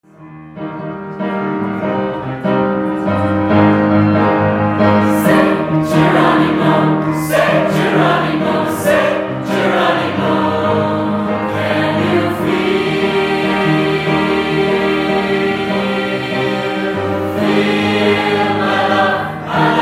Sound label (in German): 4-stimmiger Kanon